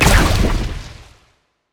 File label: explode; smite; ahpuch skin10